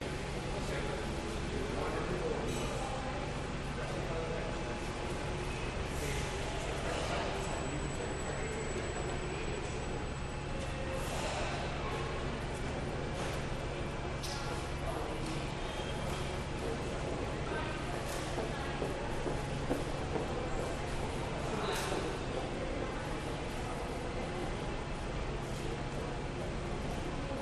glitched tones bus station ambience newcastle upon tyne uk